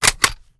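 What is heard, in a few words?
sniper rechamber bolt close; viper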